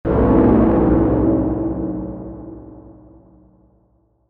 Strange Transition

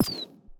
Projectile Shoot

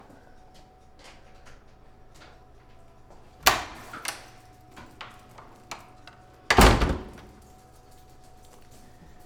Door Wood Open And Close Fast

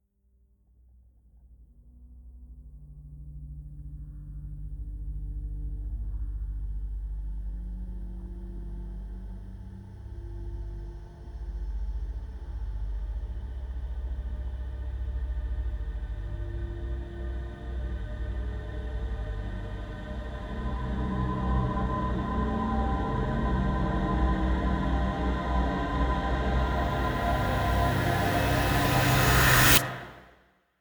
Horror Build Up; I Have To Tell You Something

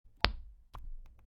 Suction Cup

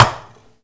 Tock

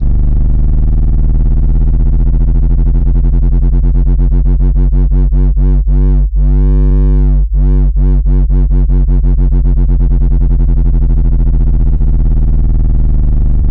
Sub Wobble Up And Down

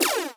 Weapon Laser

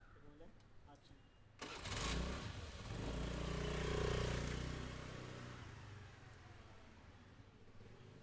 Scooter Start And Go Fast

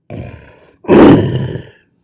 roar of lion

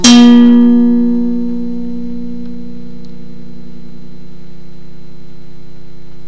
Bouncin Blues

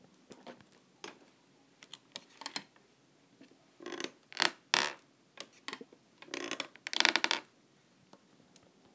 Wood Cracking

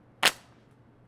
Notebook Drop Concrete

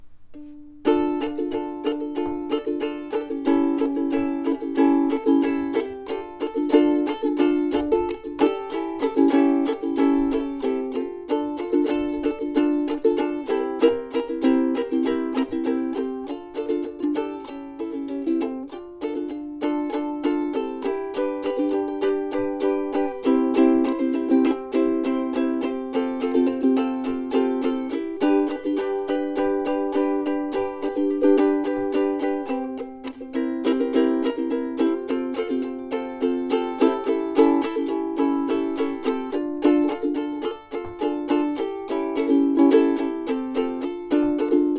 Ukulele

